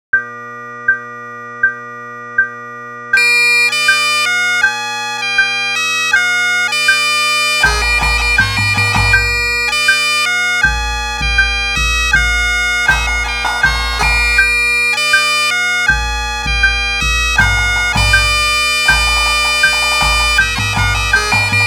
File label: Medley Ender Audio Full Score